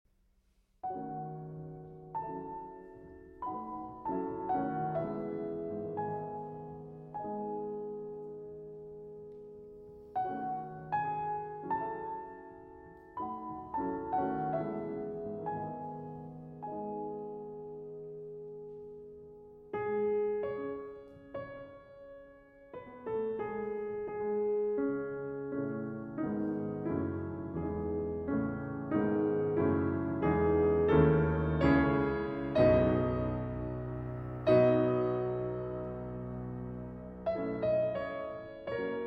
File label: Pour le Piano, Sarabande; Debussy